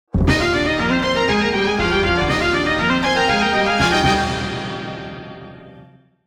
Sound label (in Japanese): ラウンドクリア（ラウンドクリア）; All Target Cluster